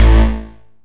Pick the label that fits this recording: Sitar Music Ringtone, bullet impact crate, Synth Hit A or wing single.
Synth Hit A